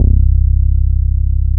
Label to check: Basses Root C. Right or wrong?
Right